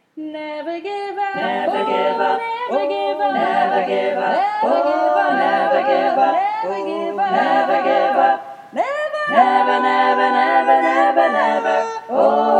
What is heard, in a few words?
SATB lead